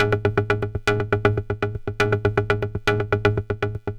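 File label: 120 MOD DRUM, Modular Synth Loops; Polyend; Simulacrum Sounds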